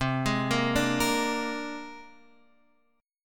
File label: C 7th Suspended 2nd Sharp 5th, C, down, Guitar, Drop D